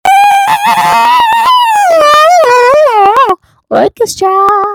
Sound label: orchestra